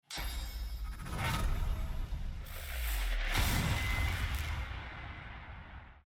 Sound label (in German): elektromagie; divinity original sin ii